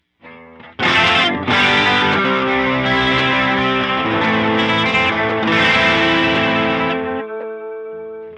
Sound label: LFO sawtooth; Moogerfooger MF-108S FRANGE